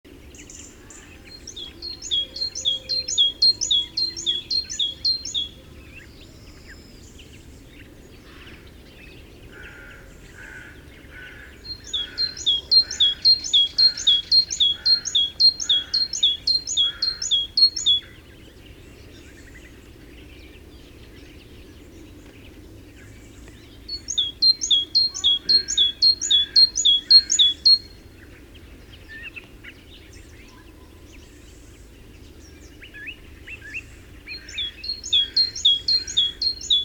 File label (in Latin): Parus major; SETE